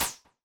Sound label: Spike Sound; Bloodungeon